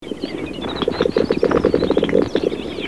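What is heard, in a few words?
great snipe voice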